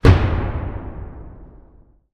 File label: warship attack cannon; ships siege